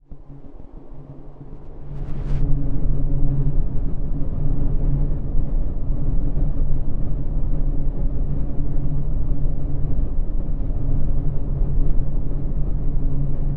Electric Hum steady and constant electric pulsing with faint shuttering in back